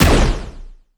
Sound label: Shotgun Laser